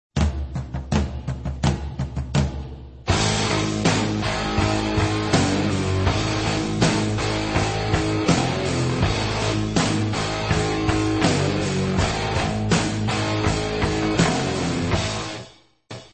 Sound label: simple rhyme